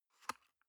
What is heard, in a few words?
paper box small open medium